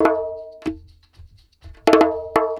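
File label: ALP PERC, 122 bpm; USB Soundscan vol.32; Roots Disco; AKAI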